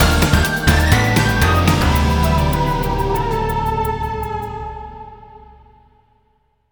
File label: Race Lose; Euder